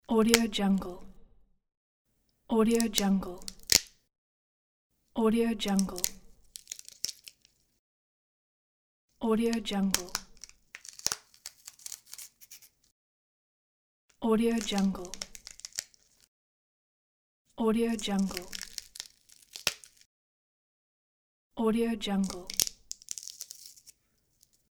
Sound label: bones snapping and breaking